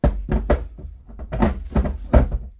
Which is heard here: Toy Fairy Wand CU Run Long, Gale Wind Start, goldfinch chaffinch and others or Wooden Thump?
Wooden Thump